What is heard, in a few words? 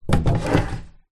household window wood frame old fashioned open